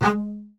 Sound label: G#3 spiccato, arco bass; Northstar - New Gold VOL-2